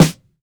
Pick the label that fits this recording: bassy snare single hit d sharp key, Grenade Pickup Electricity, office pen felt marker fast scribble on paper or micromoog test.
bassy snare single hit d sharp key